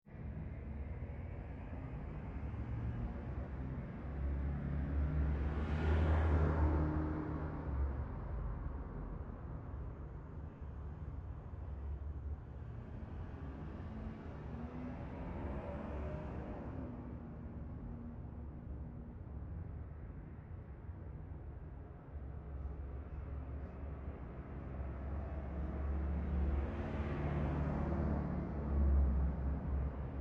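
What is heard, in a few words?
Traffic interior Residential; Traffic and Cars; Big Room Sound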